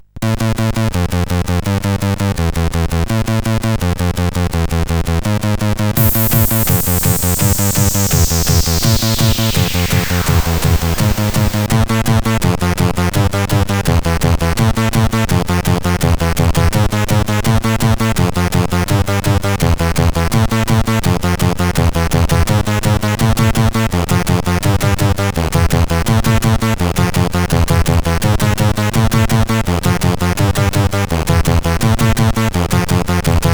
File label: CSG8580